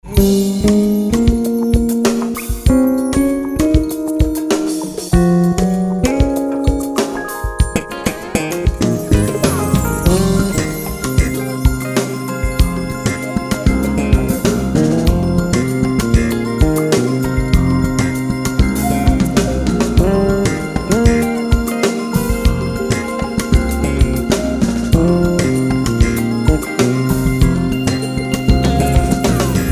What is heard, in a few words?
Bassin